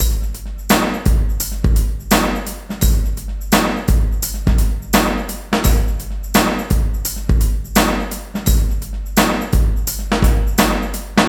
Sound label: WET LOOP 85